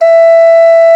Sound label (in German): OKARINA E1; FLOETE; Best Service; Alpine Volksmusik